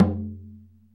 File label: CHIMNEY DRUM, PERCUSSION; AMG - Vince Clarke Lucky Bastard